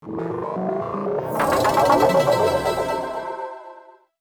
Mega Transition; Space Otter Charlie

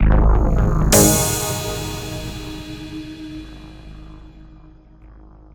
Transition; everspace 2

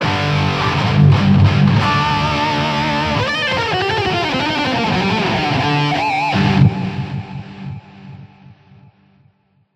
Shreds Triumphant

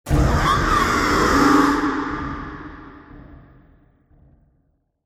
Music Stinger; HDF Ch2

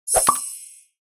Inventory Popup In; candy crush saga gamerip

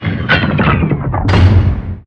Drawbridge Down

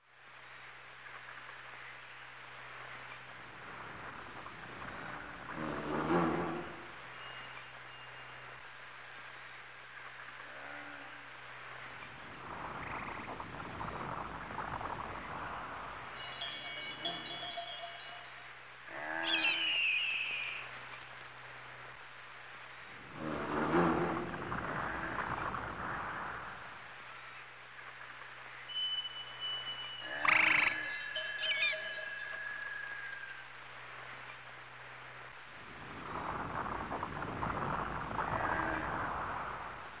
Ambience; AR Games; AR Shot

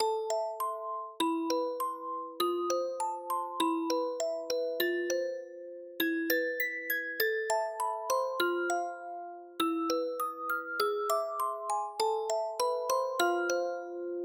Main Music; Plants vs. Zombies

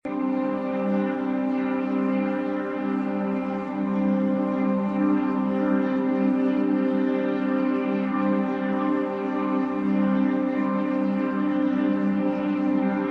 Gravity Well Loop; codespells

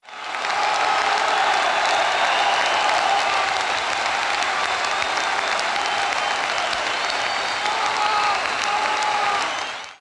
Applause Low; monster truck destruction; android

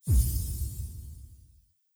ui button confirm; Hollow Knight

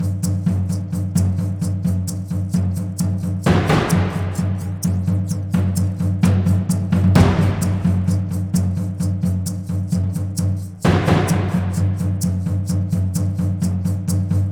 orb boss; loop til selve orben som looper

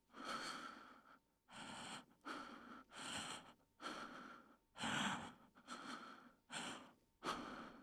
corroded Breath; edge of eternity

